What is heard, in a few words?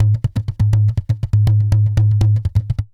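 tantango groove; Best Service; World Colours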